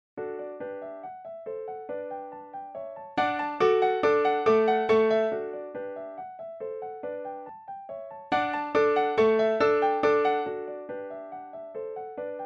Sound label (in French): Valse Pour Piano; Ludwig van Beethoven